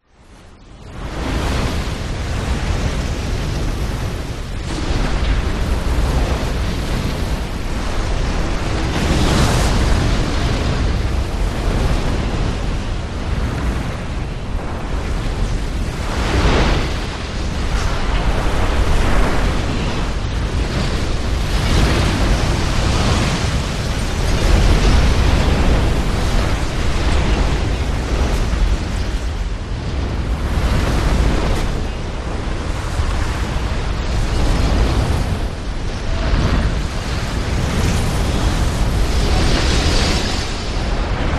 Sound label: Eye of Tornado; PMSFX